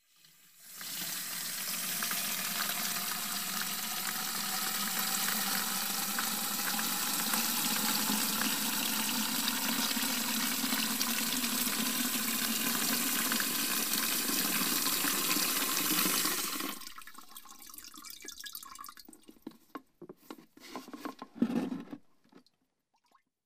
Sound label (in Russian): v banku nalivajut vodu